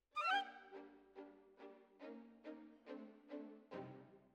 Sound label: Flute